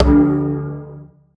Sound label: Any Key Down; MiSide